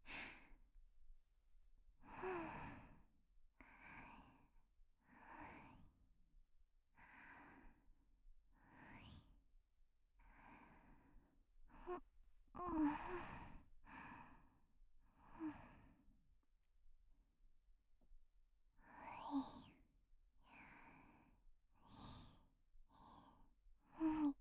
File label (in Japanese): 添い寝 寝息 ループ音源